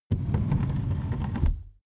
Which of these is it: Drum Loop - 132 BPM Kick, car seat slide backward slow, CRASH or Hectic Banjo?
car seat slide backward slow